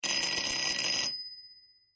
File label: Ring Classic